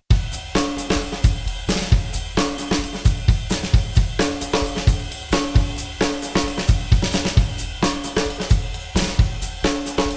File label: Drum Loop